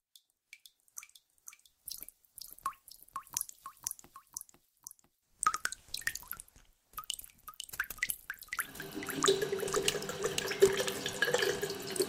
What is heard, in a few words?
soundscape sounds; muthialpet kottakuppam